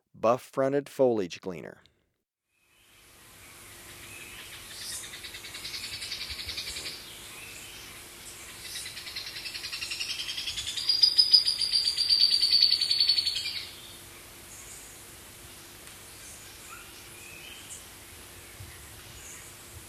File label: Buff-fronted Foliage-gleaner; S. America